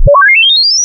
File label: Down Fast Sound